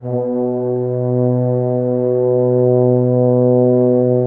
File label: French Horns